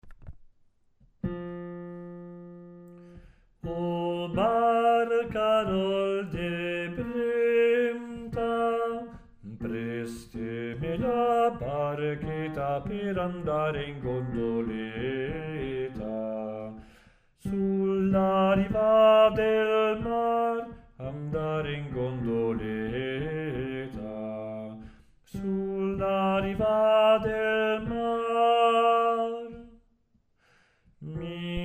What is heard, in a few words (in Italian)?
baritoni